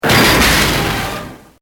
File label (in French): porte lourde